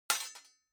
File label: weapons sword sabre metal hit